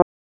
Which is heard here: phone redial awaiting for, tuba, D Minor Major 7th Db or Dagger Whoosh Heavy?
tuba